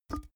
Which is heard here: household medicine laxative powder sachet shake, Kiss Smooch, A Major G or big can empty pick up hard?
big can empty pick up hard